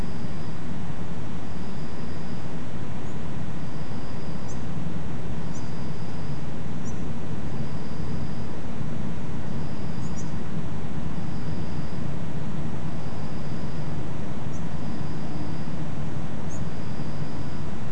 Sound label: unidentified series 5.5 8kHz, nocturnal flight call; nocturnal flight call project in beijing autumn 2021